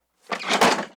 chair crash

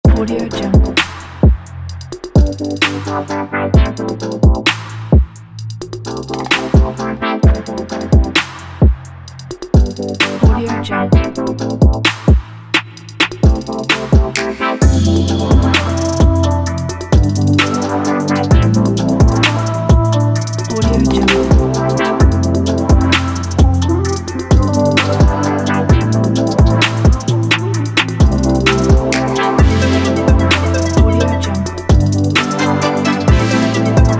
trap future

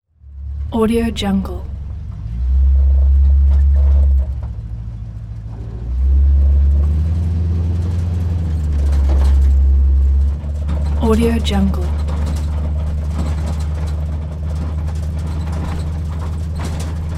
muscle car interior

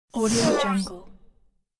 swooshy interface zoom

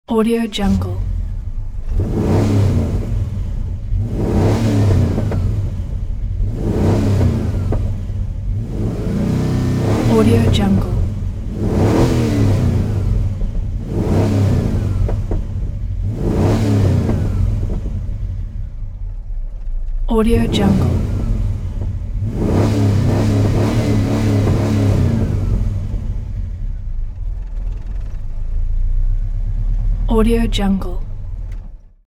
monster truck idle with heavy revs from inside; sound-ideas

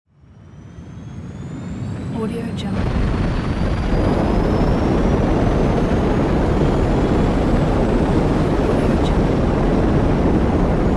rocket launch

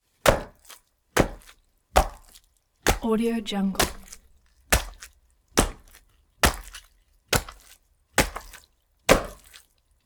meat chop chop pork with axe big meaty impacts; prosoundfx

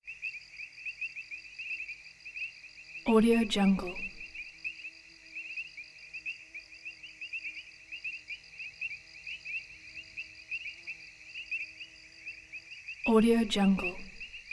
frogs and insects; prosoundfx

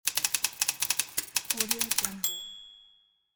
typewriter

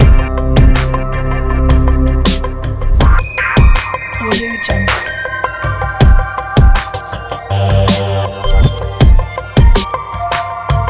chill modern logo; chill modern logo for social media

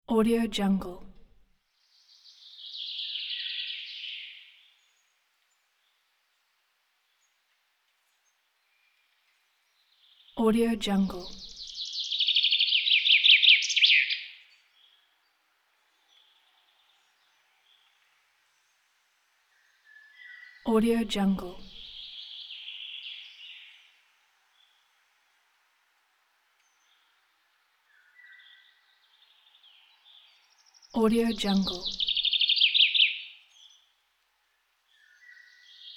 quiet forest ambience

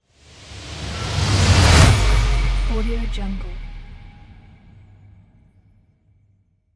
haunting whoosh

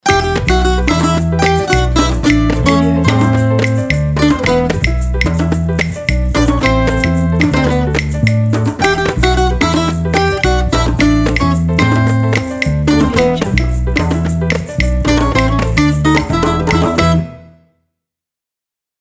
hot cuban summer; jk production007

